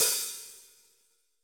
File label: cymbal, Roland MC-909